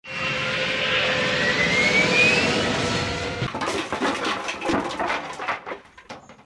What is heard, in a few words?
crash